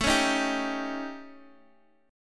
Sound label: A 7th Flat 5th Guitar DADGAD; x,x,7,6,6,5